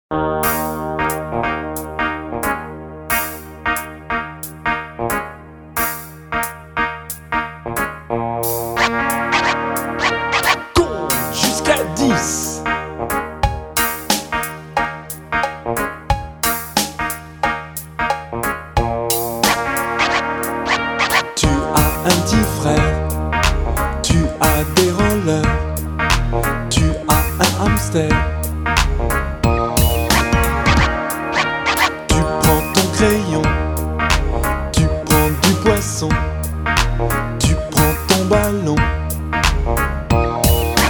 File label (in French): VERSION KARAOKE